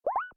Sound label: Mic Unmuted